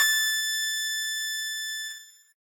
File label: piano